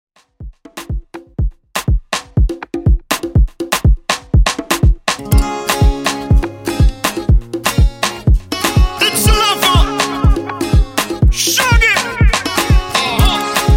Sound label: Afrobeat Dance, 122Bpm